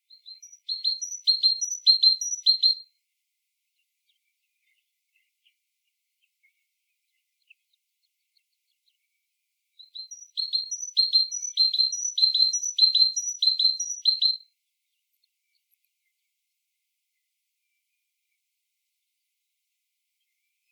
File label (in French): chant mesange charbo; oiseaux